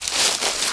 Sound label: STEPS Bush, Walk; High Quality Footsteps